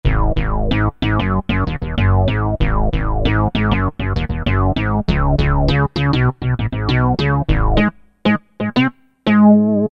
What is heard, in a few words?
Ultra Rez, Korg N364; Factory Prog demos